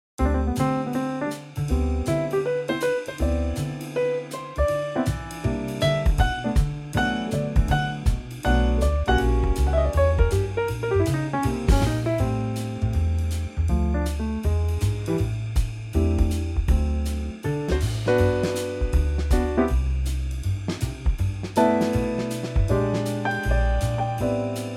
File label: G trio